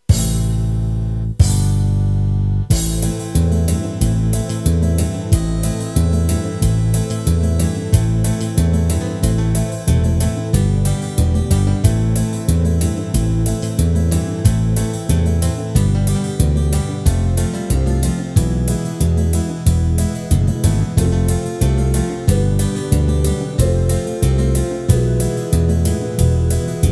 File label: Country Beat